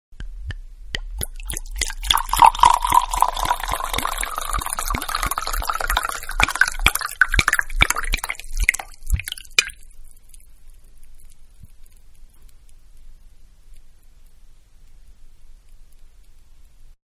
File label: Wine Pour, Wine-Pour From Glass Carafe Into Wine Glass; Pouring; Manhattan Production Music